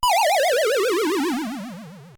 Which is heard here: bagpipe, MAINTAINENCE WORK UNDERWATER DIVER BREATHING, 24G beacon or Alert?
Alert